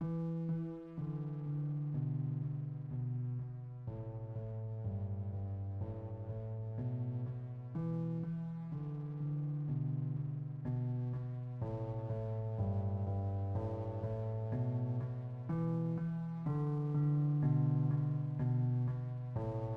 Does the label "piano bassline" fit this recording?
Yes